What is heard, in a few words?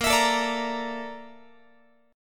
Bb Major 7th Flat 5th, Guitar; strummed